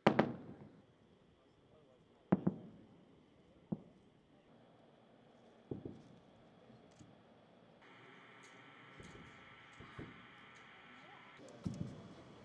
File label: sonic boom